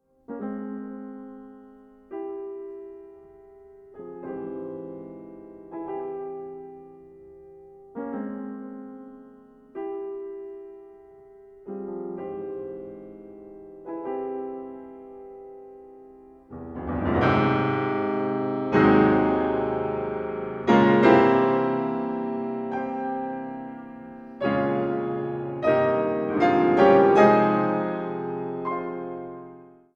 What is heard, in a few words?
Etude Tableau c-moll Grave